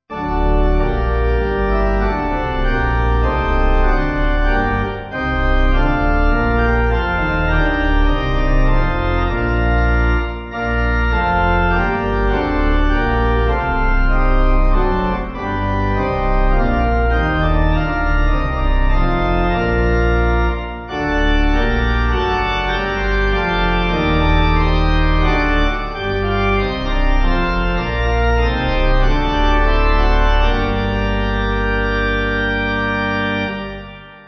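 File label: Pipe organ, church music backing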